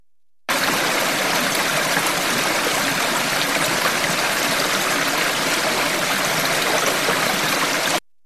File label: stream deep